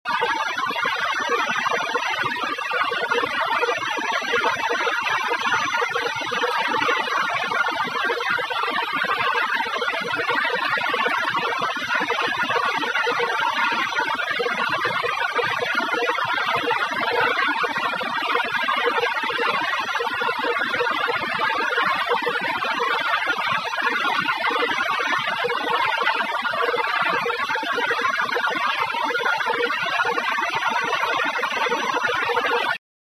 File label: Alien Data Syntheti